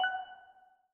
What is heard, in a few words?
click